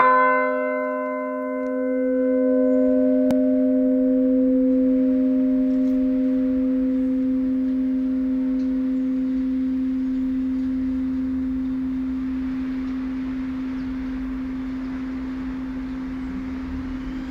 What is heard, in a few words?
bells, eastbourne